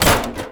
Metal Ladder Hit; Warframe; Ambience; Nineteen Ninety Nine

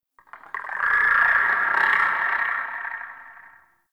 Ext Chatter Scream; Ambience; Misc Outdoor; Warframe; Infested Eris

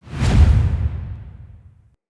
Finisher Start Accent; Weapons; Envoy

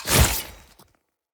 Short Sword Stealth Attack Pull; Weapons; Finishers

